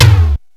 SYN-TOM; Rubber Chicken Software Sample Bank; DRUMS + PERC

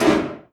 Oneshot; Sonic Foundry (Sony Creative Software); Esoterik Beatz; Annoya

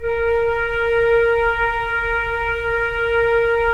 FLT FL, Flute; Roland - LA Composer vol. 4

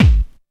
techno 2, bassdrum

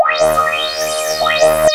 filter shred, transwaves; Soundengine - CSR-000 The Art of Transwaves; MOVING PART

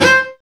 STR Vc Attack; Roland L-CD702; Vc Marc&Harm

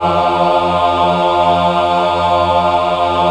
F2 MW AH, Choir; USB Soundscan vol.28; Acoustic & Synth; AKAI

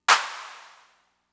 Clap, Fricke MFB-522, Drum Machines

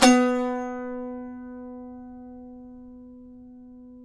SAZ B 3; Spectrasonics; Hans Zimmer Guitars VOL-2

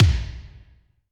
Kik Stab HL, Drum Machines Alesis D4

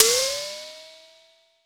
HIT WNOISE

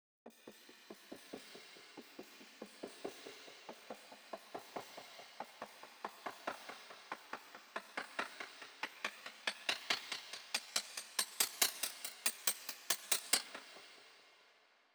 140 BPM Intro Break Drums Perc; Vengeance - Essential Clubsounds Vol.5; VEC5 140 BPM Vengeance Intro Kit Root E

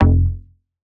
Bass F, Bass Synth

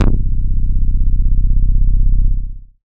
bass c, Sub Bass; Dance Synths; Samples vol.37 - Dance Synths

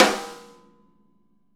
snare rim; Sonic Reality; ID SNARE 3+

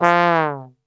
F 1 TRB, Brass Super Section; Best Service; 90 s Sample CDs

